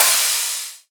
Acoustic Drums - Cymbal Crash; Heavy Acoustic Drums; Antidote Advent

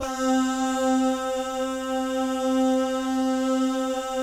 BAH GUY, Vocal Planet Jazz & FX; ILIO; BAHS GUYS

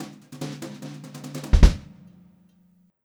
Brushed Drum Loops, fill; USB Soundscan vol.39; AKAI

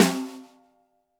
Snare, Roland TD-20

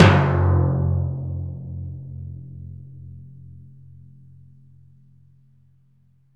Timp, Timpani Dry; Roland LCDP03 Orchestral Perc

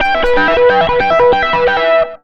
SOLO L, Electric & Acoustic Guitar Loops; USB Soundscan vol.04; AKAI; 120 SOLOS

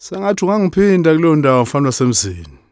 Vocal Chirp, African Voices

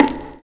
CABASS; Univers Sons - Kitch 70s

